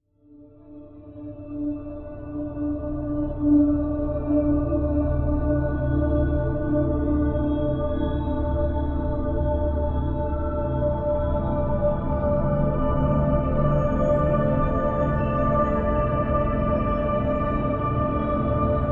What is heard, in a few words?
apple piano ambiance